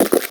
chug; t&t; godot

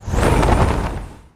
damage; rayfa

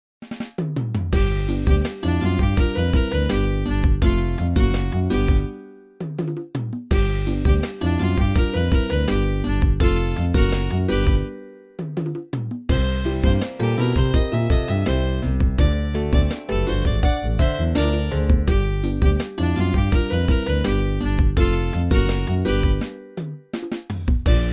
drunken waltz

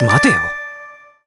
problem; hosonaga

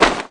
arm roll; viridian

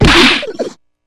uhuhuhu; p4u; shadow labrys

